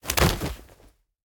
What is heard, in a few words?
victory bodyfall; Smite; Cerberus